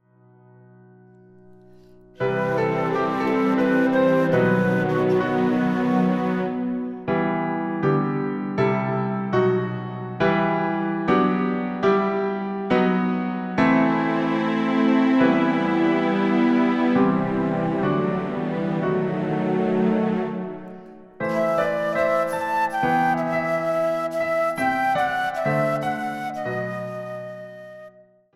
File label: instrumental; The Easter Sequence Christ My Hope Is Risen